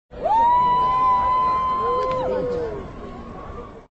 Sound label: success; MaseratiGranturismo; Racing Games